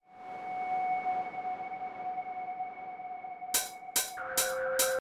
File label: Music; Sifu